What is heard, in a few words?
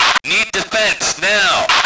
radio; lmctf; andromeda